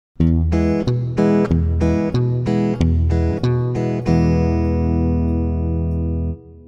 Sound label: country tele e chord